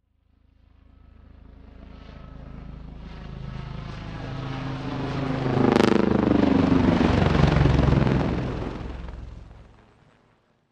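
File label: Heli Lynx Slow By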